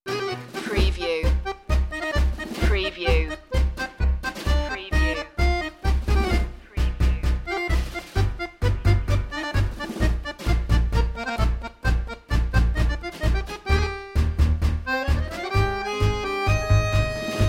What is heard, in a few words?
tango